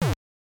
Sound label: Bump